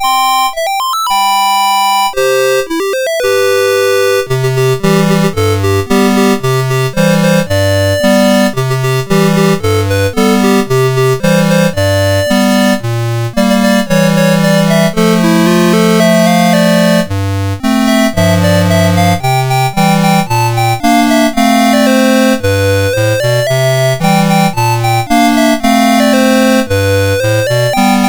Cheers, MOS6581R3

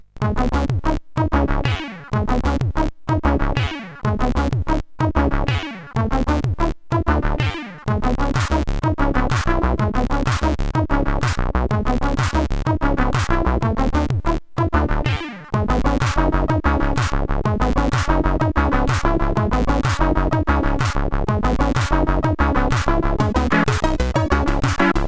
sid, CSG8580; Lucid; hvsc

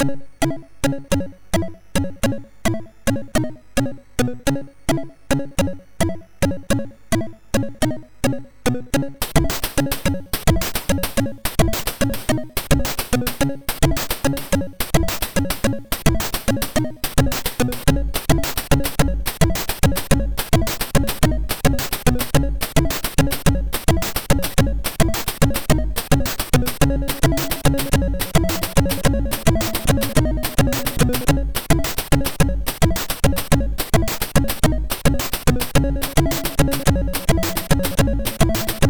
ringtone, MOS6581R3

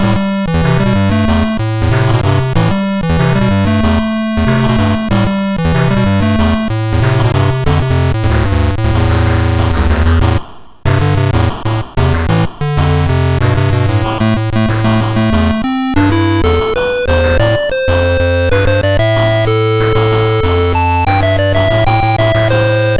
sid MOS6581R4; Learnanewsoundchipin10min

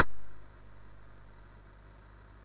Project Firestart, MOS6581R4

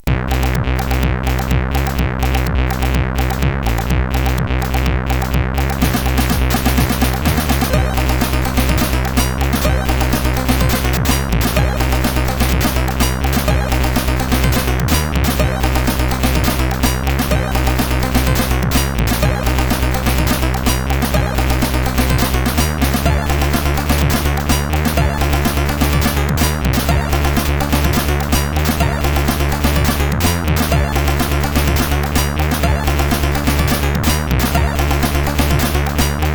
Techno, CSG8580R5; Shade

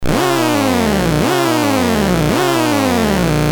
MOS6581R4, GAMES; Tron BASIC; HVSC

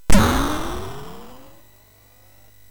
sid MOS6581R3; DNA Warrior

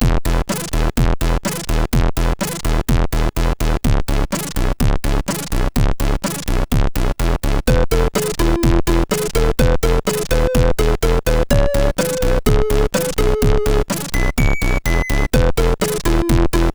MOS6581R3, sid

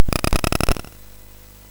SFX, MOS6581; Narco Police; MOS6581R4; hvsc

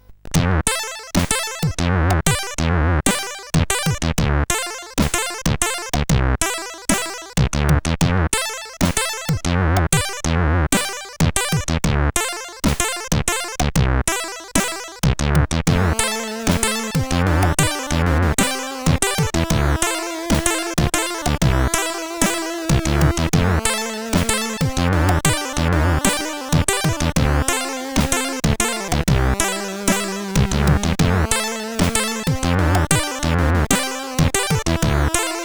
Explosion, CSG8580R5; Deek; hvsc

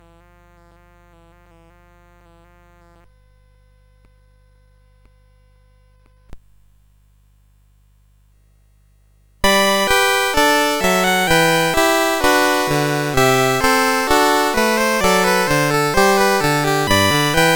Gavotte, CSG8580R5; Joseph A Grau; Fifth French Suite

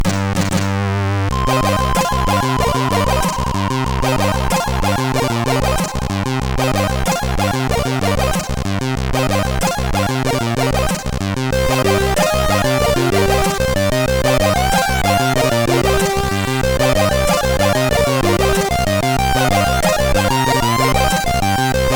Leech, MOS6581R3; hvsc

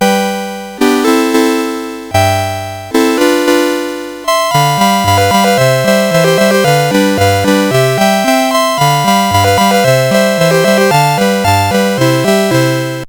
Pizzicato Polka, CSG8580R5; Pizzicato Polka B; Dave Marquis

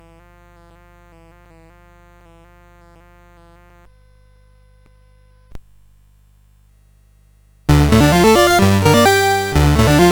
Drill ye Tarriers Drill, CSG8580R5

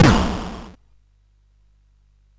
SFX; Narco Police

